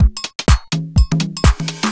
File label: HOUSE BEATS 125